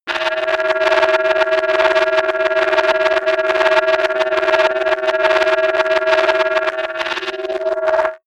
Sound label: pitch jump rumble long, sciene fiction